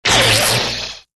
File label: lightning hit